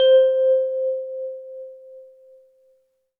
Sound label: Jupiter-6 BELL Vibes Low C; Synth; One Shots; Cymatics; Fantasy Synth Sample Pack